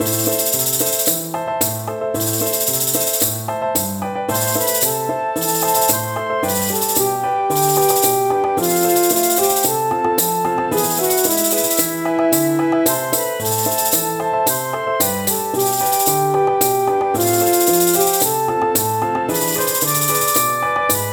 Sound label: Ballad; MTWFC VOL2 Parts1&2 Audio Accompaniments